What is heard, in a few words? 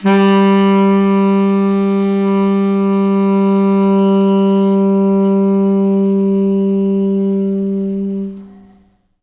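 tenor sax, G2; B. Webster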